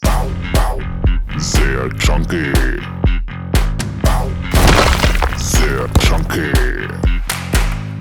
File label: chunky